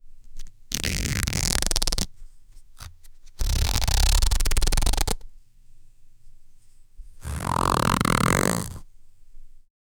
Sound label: cartoon stretching and ru